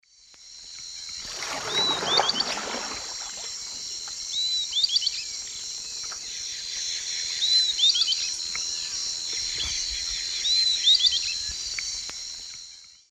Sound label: Camptostoma obsoletum, call; S. America; Eastern Lowlands